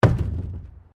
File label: PLASTIC BARRICADE IMPACT